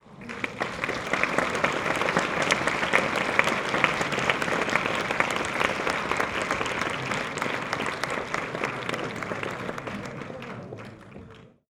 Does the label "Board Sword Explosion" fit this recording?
No